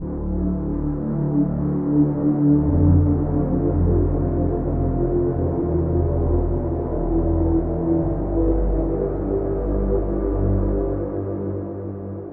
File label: Pad, Spire; Observer Field; Routed Sound; Spire Expansion; Quantum Enforcer Vol.1